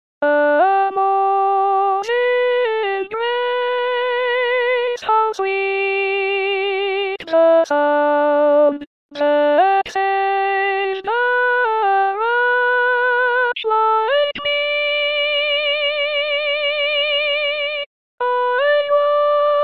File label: alto; amazing grace